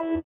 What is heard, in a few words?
sitar, Indiatek; Spectrasonics; Metamorphosis